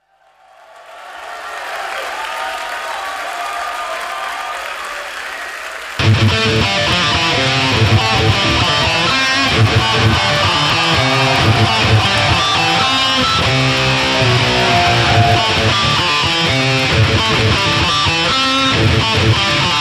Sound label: Metal